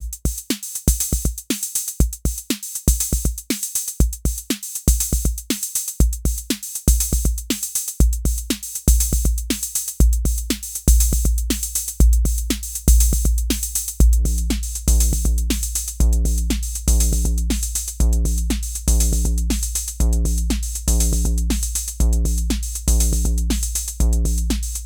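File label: Roland TR-06 Boutique Simple Beat bass drum Decay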